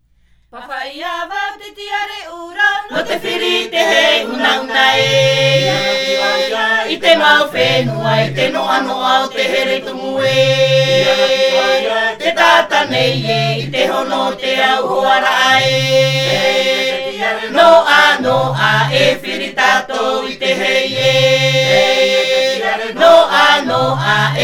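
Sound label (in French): Himene tarava Tahiti, chants polyphoniques maohi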